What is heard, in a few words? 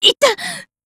pain; MC Defence